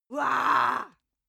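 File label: female aggressive, screams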